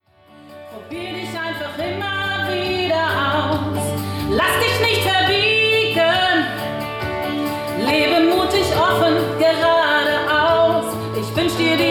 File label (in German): Gesang; Arlt Entertainment